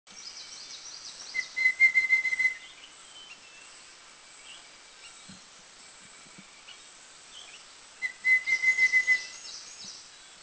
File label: Rufous-capped Babbler; Mishmi; India